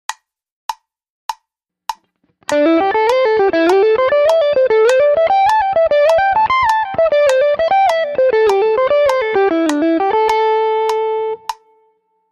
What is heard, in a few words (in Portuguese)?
Penta 2 Cordas Slide 100bpm